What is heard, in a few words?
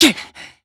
Emo Pain